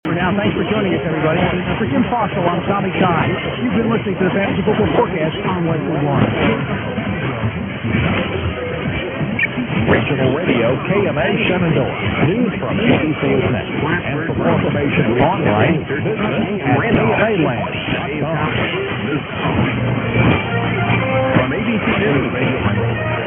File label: 960, mw dxing; kma